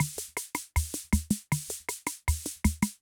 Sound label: Loop, rhythm king; classic machines